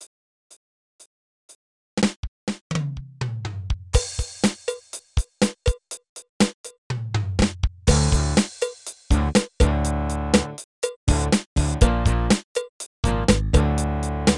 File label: rock power sync microsoft gs wavetable synth; bandora creator